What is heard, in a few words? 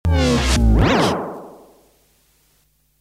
Stingers